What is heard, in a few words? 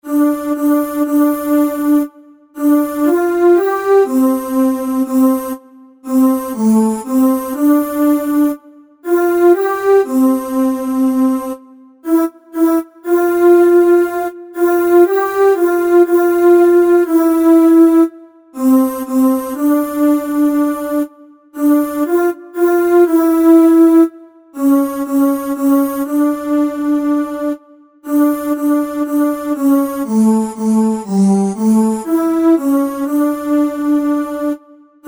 Synth